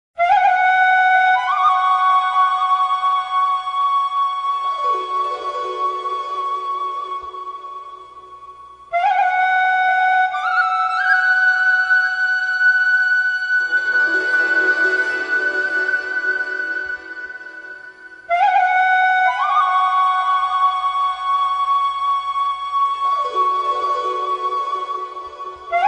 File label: Morning Alarm Flute Ringtone; ringtones